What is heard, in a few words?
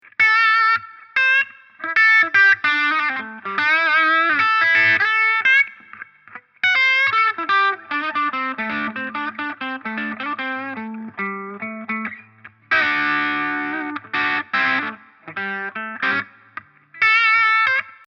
Straight Flush Low Gain Max.